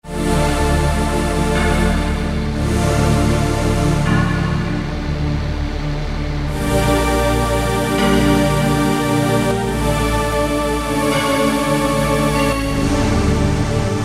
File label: Cool Pad; Roland M-DC1